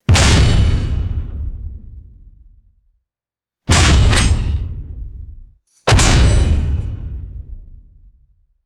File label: three heavy designed cinematic impacts; guns